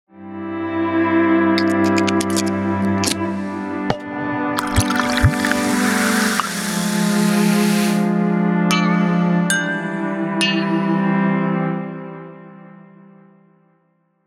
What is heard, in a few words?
beat emotion